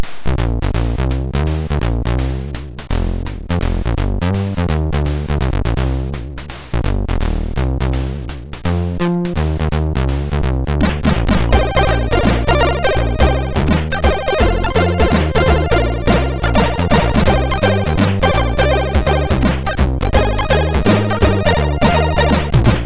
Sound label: Funky Feature, MOS6581; MOS6581R2